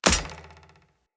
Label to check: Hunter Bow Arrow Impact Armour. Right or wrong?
Right